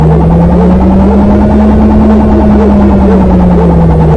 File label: pumpy pulse, Alarm Sounds